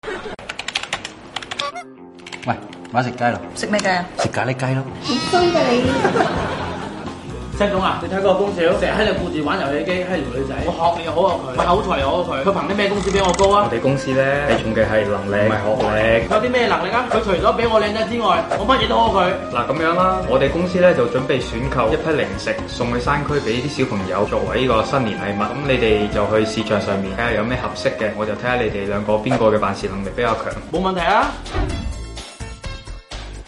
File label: laugh; breadradio